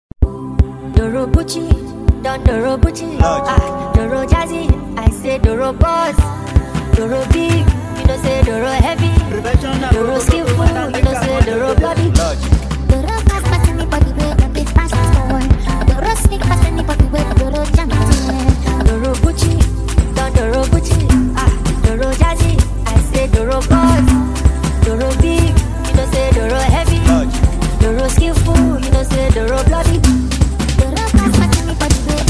Professional Beat

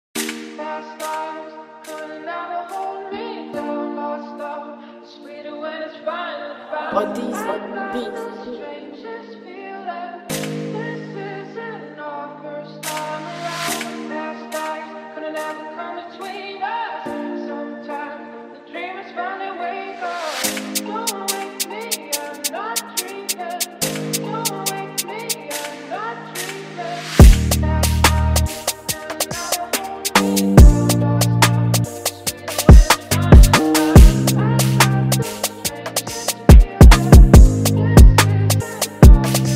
Drill Version

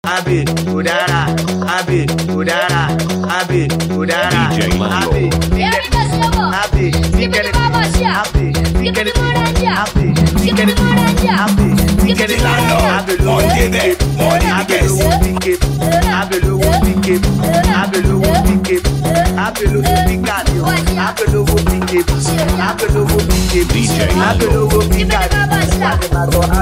Beat

